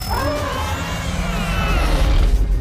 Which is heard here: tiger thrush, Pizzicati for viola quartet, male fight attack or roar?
roar